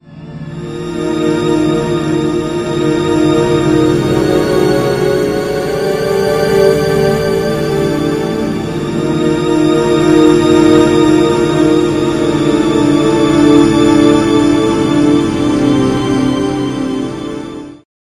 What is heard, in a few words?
Nebula Dark Atmos; Magnus Choir; Syntheway